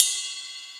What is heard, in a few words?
Yamaha CS6 B